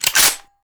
m4a1 bolt release, weapons; garrysmod; dmg m4a1

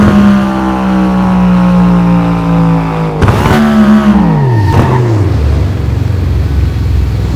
Throttle off slow, vehicles; garrysmod

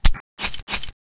usp silencer on; weapons; usp45; garrysmod

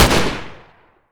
ak47 fire; garrysmod

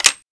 mp412 open; garrysmod

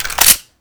clip in, weapons, glock17; garrysmod

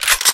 m3 pump; garrysmod

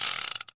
spin, weapons coltpython; garrysmod

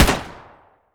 cf05 fire; weapons; garrysmod

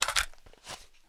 g36c mag out; garrysmod

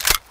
auga3 clip out; garrysmod

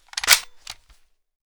g3 bolt forward; g3a3; garrysmod

